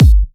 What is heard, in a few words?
Bassdrums; Vengeance Samples